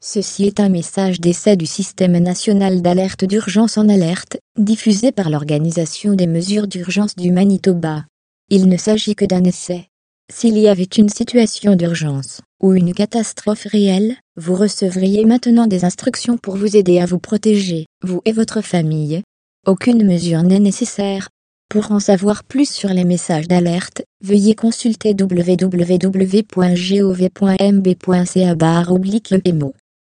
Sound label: tone